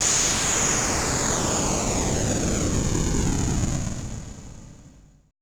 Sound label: AMBI FX